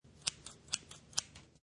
Scissors Snip 3 Times, Office scissors snip three times, no paper; Scissor Sound Effects